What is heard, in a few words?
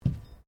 cutlery general place cloth, Household cutlery; Kitchen